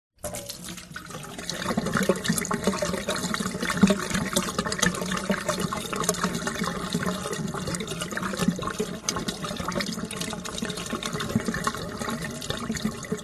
household kitchen plastic 1 litre bottle empty water into metal sink drain gurgle